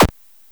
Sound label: bed squeak